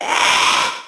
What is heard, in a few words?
pain; Thorn